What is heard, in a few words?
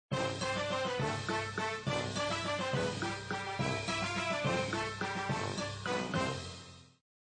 music maker; Jolly jubilee music maker